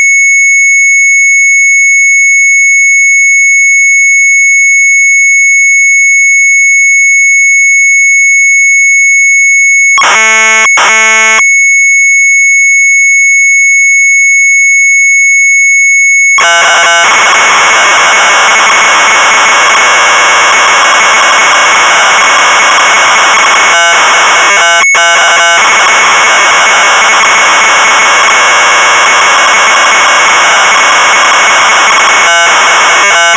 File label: MZ800 Tape; mz800 wav; Input Routine; Sharp